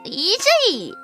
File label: polka